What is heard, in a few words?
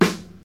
high quality clean snare sample f key; snare-drum-samples